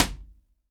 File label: DESK, HANDY PERCUS; AKAI S-Series CD-ROM Sound Library VOL-5